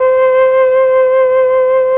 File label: FLUT; ILIO; Propeller Island Legendary M400